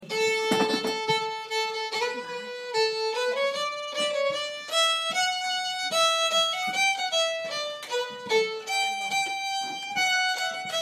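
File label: Walking Tune In D minor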